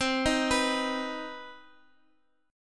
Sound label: C Minor Major 7th, chord; arpeggiated; CmM7; x,x,x,5,4,7